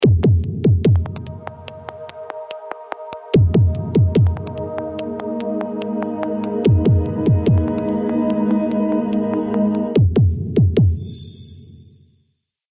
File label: heartbeat ringtone; audi